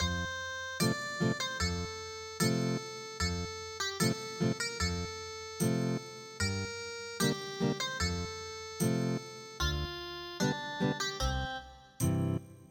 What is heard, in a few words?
chanson du toreador Ethnic Guitar